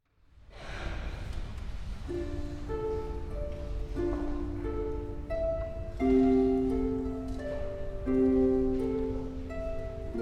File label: Harp Solo